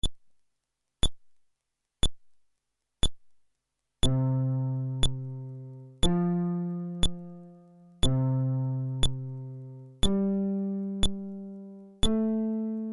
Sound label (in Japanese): フレットレス